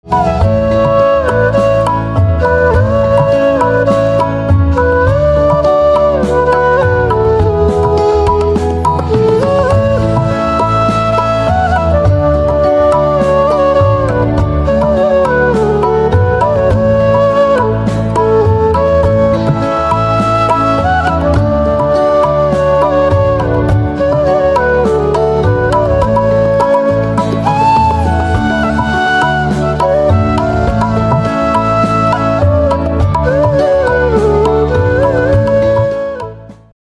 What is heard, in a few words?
Flute, instrumental ringtones